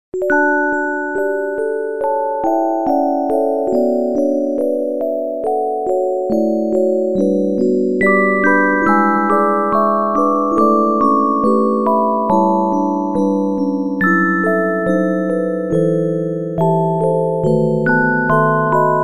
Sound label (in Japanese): レトロケータイ着メロオルゴール; G線上のアリア